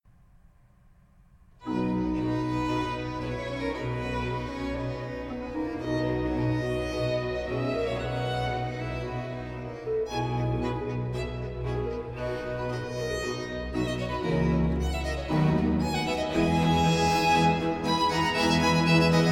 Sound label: C major for 2 violins and basso continuo and organ obbligato Allegro